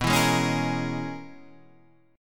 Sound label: Db Minor 13th B